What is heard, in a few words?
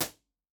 crash; genesis 6 studio live room drum set; b-format